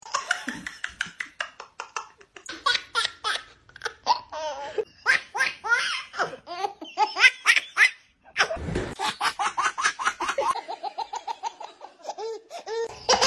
baby lachen

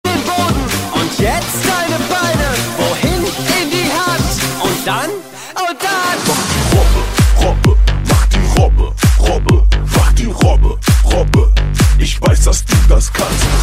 klingelton

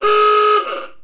alte hupe

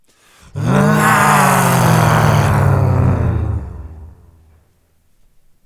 schrei

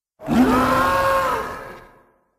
riesen spinne schrei

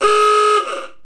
hupe

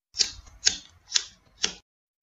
feuerzeug sounds

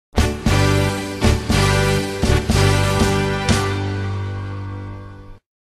karnevals tusch

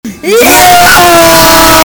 schrei

